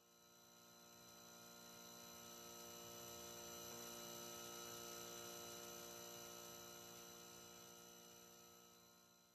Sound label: hum; Minecraft; liminal-industries; Liminal Resources